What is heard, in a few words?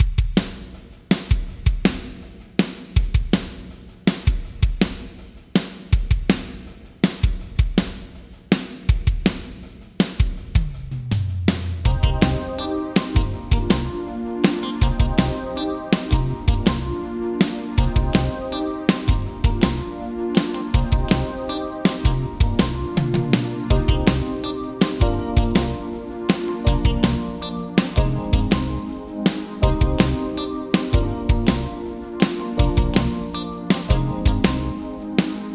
Drums Yamaha Rev7 Spring; Vintage Digital